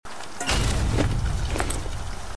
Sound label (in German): verletzung; The Flame in the Flood